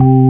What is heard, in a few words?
sc88pro organ2; tumbledemerald